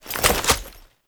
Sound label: Exalted Sniper Reload; Warframe; Frumentarius